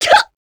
succubus shout; queen girl

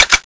clip out; tfa cso; t50

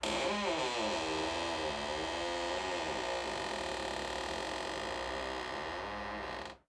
door move

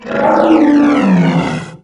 sight, doom3 pinky

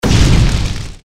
fire gecko flameburst; npc

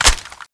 box in; cso; skull8

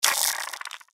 mirelurk injured; npc

